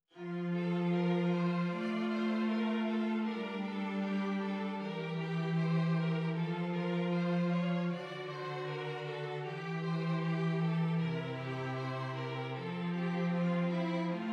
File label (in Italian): esercizi di contrappunto semplice A tre voci